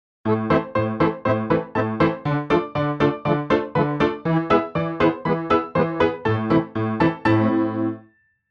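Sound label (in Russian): интро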